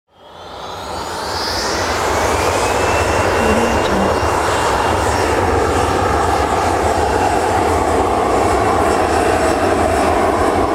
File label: takeoff